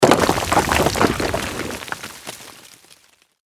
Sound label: ore fall